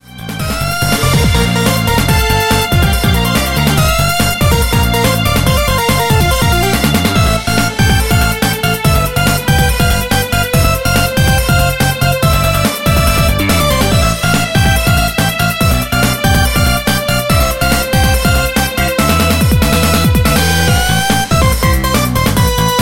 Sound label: Bass Thrill; MIUI12